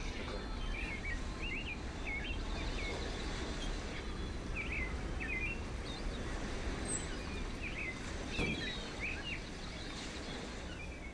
stupid ambient sound in our hotel

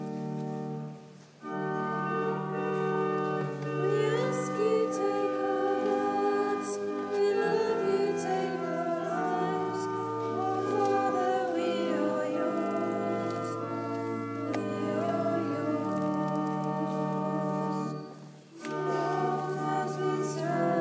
offertory hymn

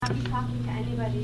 celebrations

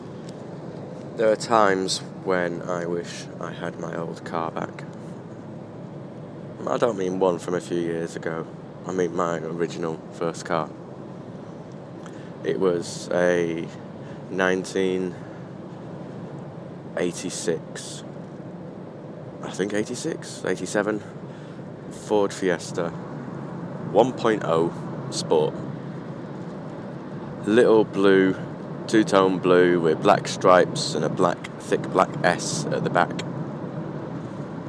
engine and car

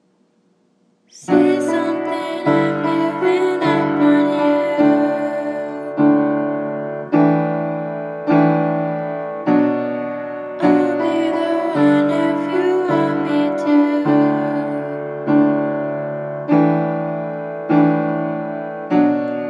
w piano

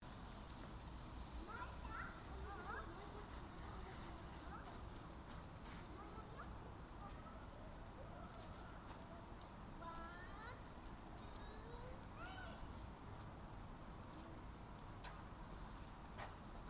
children playing outside; colchester

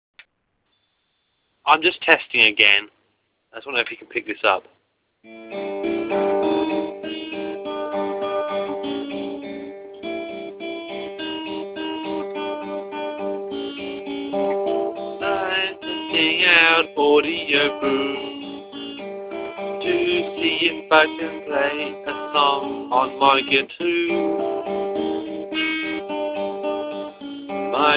guitar playing

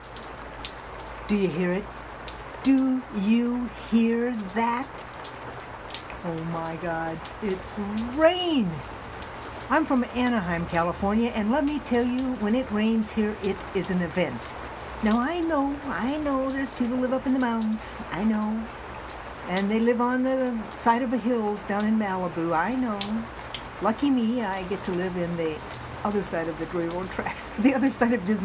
i m in socal

